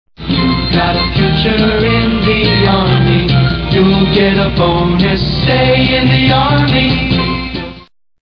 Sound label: Re-enlistment Jingle; chairborneranger